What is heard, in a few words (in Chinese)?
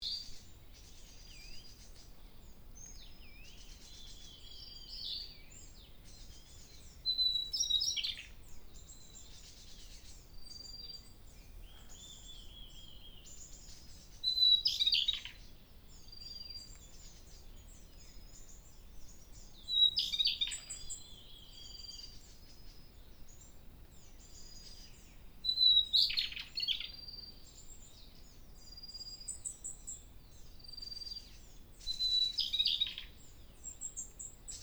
小翼鶇; 乾淨